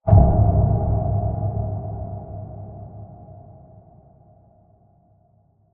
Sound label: Impact cinematic muted resonant with reverb